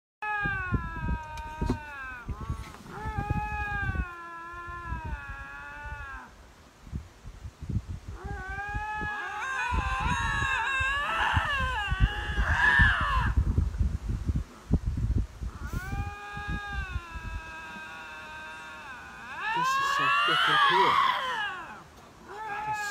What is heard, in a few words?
Lynx Screaming